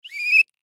Whistle Plastic; Whistle Blow Short Burst; Foley Whistle Plastic Blow Short; Blastwave

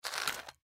Flashlight Pick Up Cement; Flashlight Pick Up Cement Plastic; Flashlights; Electronics; Foley; Blastwave

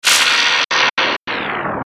Stutter Ascend Descend; Production Element Imaging Element Accent Transition

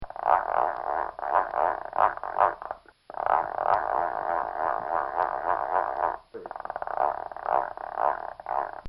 Cartoon Sound Effects Cranking; Cartoon Sound Design; Bobby Cole

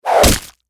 Arrow Impact Human Body; Bow And Arrow; Bows and Arrows; Combat; Warfare; Blastwave

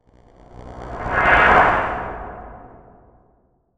Whoosh Electrical Pass By; Blastwave

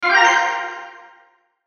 Mouseover Organ Ascend Reverb; Multimedia; Blastwave

